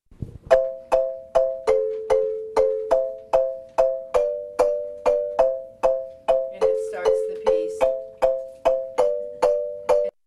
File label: soprano, marimba; second soprano; chords 1